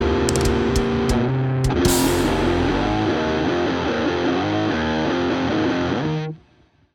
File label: riff; Reading 2017